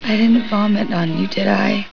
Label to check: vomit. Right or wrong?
Right